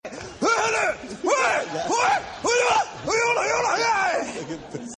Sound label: Yuhuuu